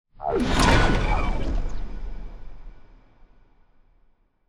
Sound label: ship construction ship move; Stellaris; shroud